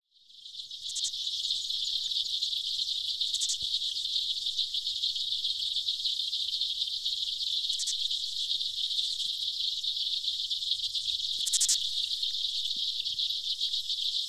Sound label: Aquatic Insects